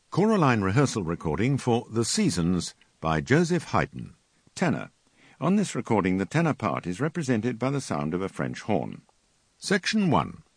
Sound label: Tenor; Haydn Seasons